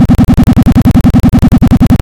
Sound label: engine; Kenney's Sound Pack; Retro Sounds 2